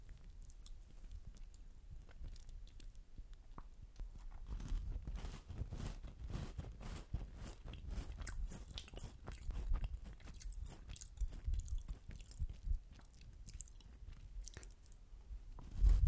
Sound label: Chew